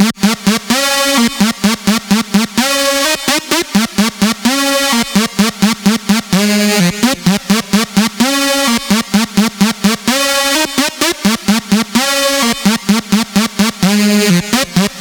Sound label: 128BPM Lead Loops A#; MELBOURNE DROP VOL. 4